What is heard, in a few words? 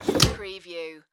Foosball Table Player